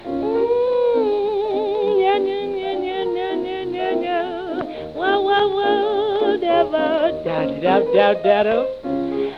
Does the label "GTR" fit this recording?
No